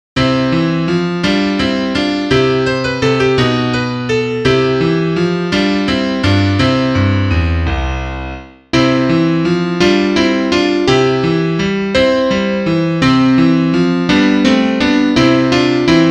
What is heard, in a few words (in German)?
Klavier; freut euch des lebens